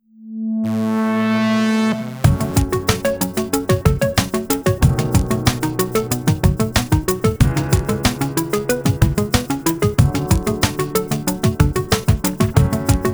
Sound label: Arp and Piano Beat; Background